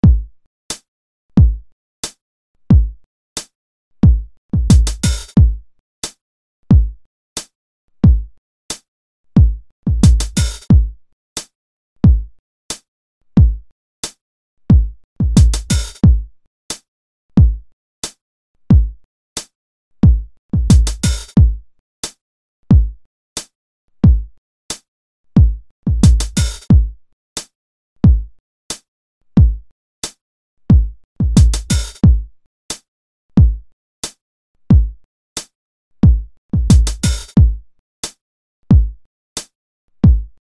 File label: Beat Simple Kick Highhat